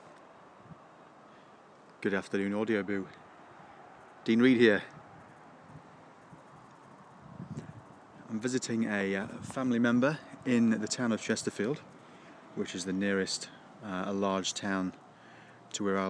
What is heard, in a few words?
football crowd soundscape; in the lake district